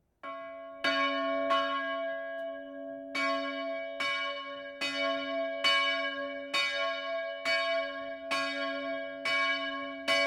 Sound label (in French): cloche; campanologie; madeleine